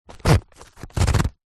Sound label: paper sheet cut with serrated knife; zapsplat